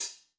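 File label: brush soft; Northstar - New Gold VOL-2; 30S BRUSH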